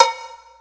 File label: perc, Percussion; Trance Explosion Vol1